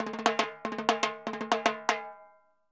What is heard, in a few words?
Timbaleta Poly, Drums & Percussion; Best Service; Festa Latina VOL-1